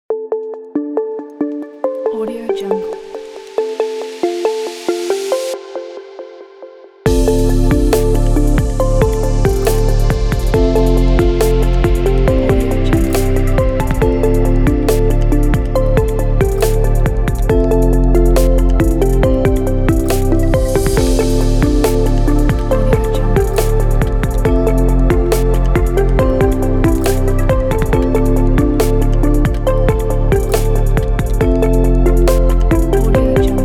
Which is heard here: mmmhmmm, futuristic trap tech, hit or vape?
futuristic trap tech